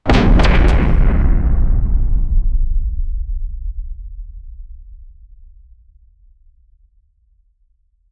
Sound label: a massive deafening w, shutting